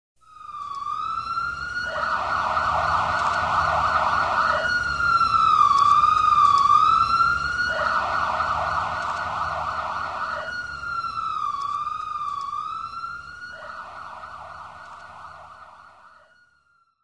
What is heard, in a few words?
siren on the street; SOUND EFFECTS1